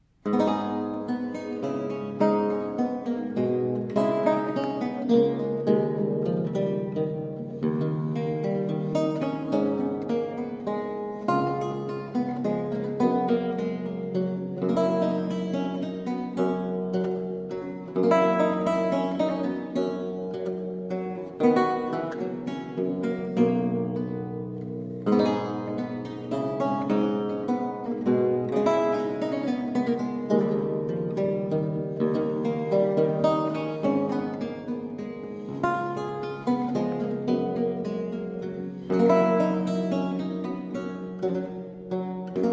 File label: Suite in a Minor III Menuet, Lute; Count Ferdinand Graf von Bergen